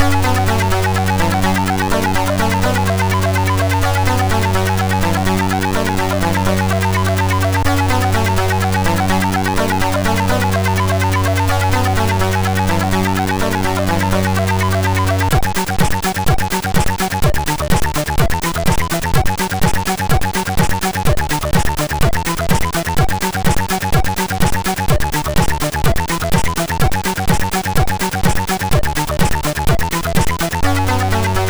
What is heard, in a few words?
sid MOS6581R3; hvsc